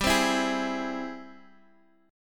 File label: Ab Augmented Major 7th G, guitar